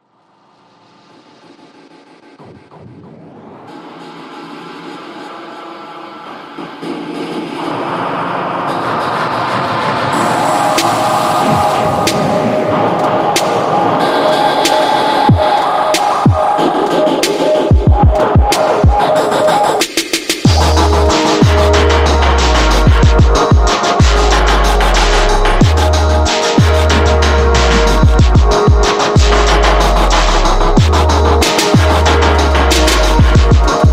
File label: Unrelenting Machinery; Regretevator